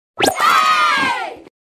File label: nuggit box opening and yay; chikn nuggit